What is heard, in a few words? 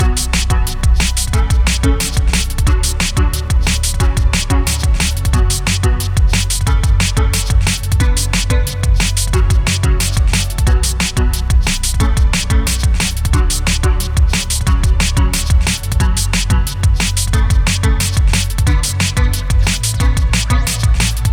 Level complete Loop; Hyper 5; ps4; xbox series xs